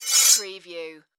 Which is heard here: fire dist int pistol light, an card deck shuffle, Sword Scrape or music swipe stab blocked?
Sword Scrape